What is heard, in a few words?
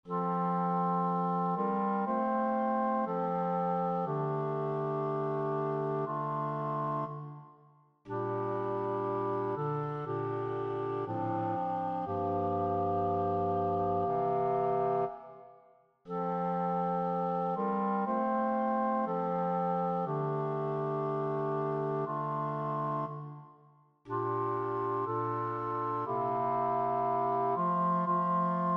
tutti